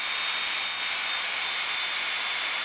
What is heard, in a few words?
engine midair